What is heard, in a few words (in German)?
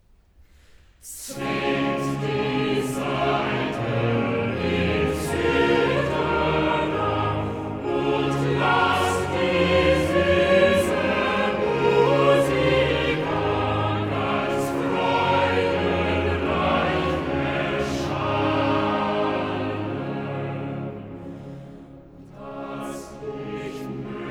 Choral; BWV 36 'Schwingt freudig euch empor'; Part 1, IV. Choral 'Zwingt die Saiten in Cythara'; Bach; Köln; 1st Sun in Advent; Cantata